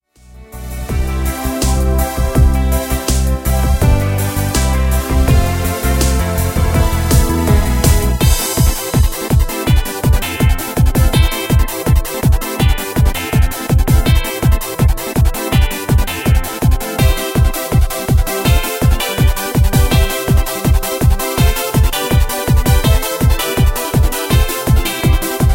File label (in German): Message ohne Backings